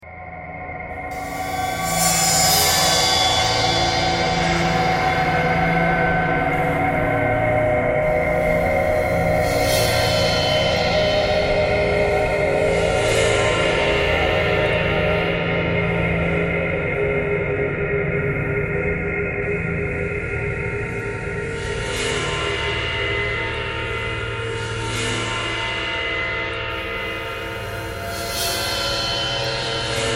ice bowed cymbal traffic kcore birds a thread pentatonic